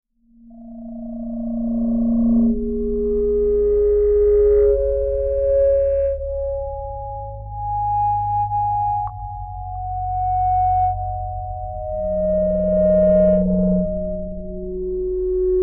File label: Click Melodic; Beds